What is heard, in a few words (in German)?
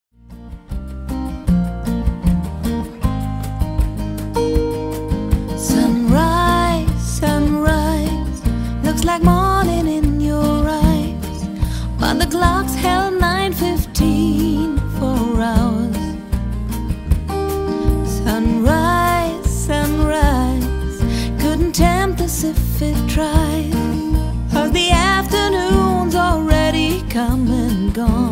Saengerin